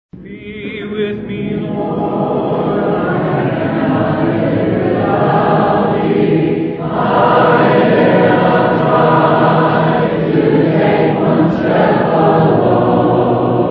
a capella hymn; be with me lord

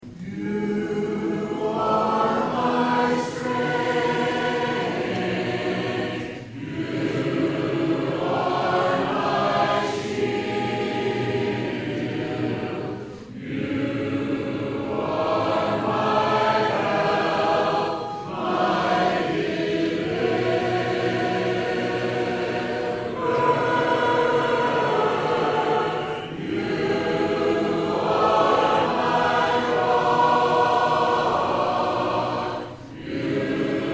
a capella hymn, you are my strength

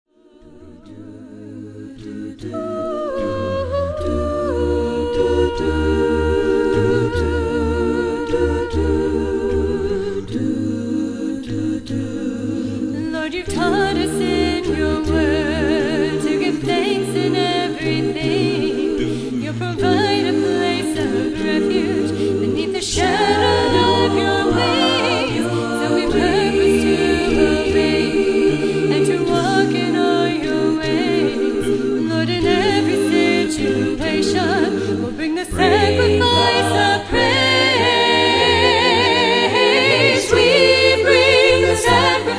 a capella hymn

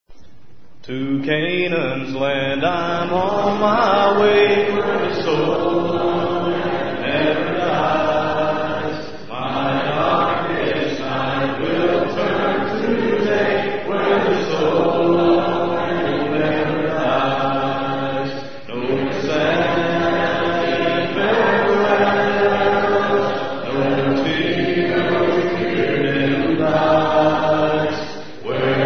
a capella hymn

